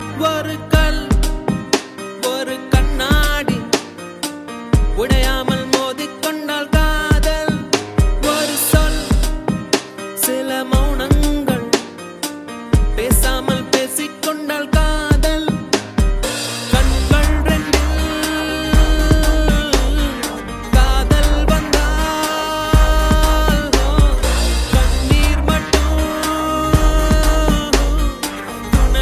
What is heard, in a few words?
Tamil Kadhal Ringtone